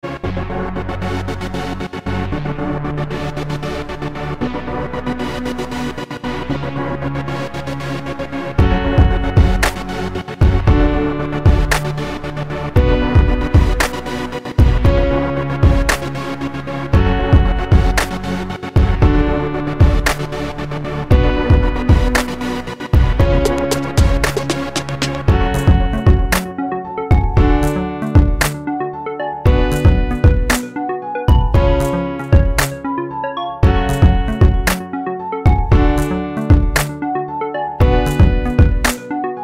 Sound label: beat